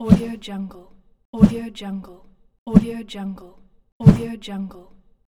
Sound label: swipe kick